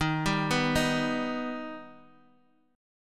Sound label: D sharp Augmented D sharp Bass Standard; D#+ arpeggiated; 23,22,21,20; JGuitar